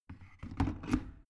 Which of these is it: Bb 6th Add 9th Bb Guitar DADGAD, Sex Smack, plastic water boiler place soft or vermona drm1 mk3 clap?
plastic water boiler place soft